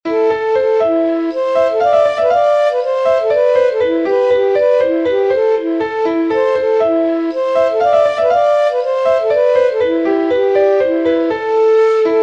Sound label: Mazurka